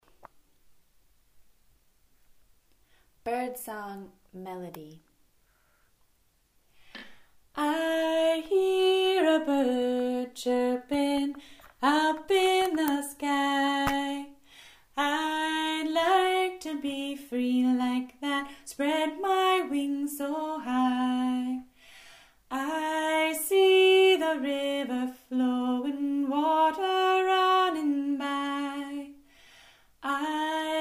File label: Bird Song Melody